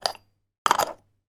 household china ornament lid remove and place back on ornament